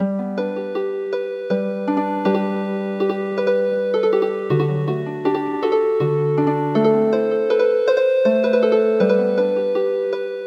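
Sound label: Healing Harp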